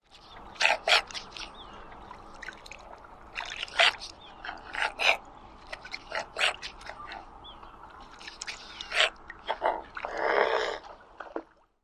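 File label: snort & growl, Mute Swan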